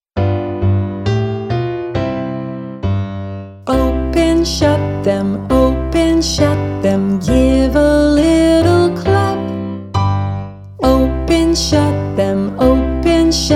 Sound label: open shut them, female; Kindergarten Music Lessons